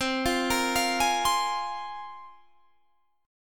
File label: C 7th Suspended 4th Sharp 5th, Guitar, Drop D